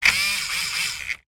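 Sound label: toy remote control car turn wheels tilt motor whir still upside down; zapsplat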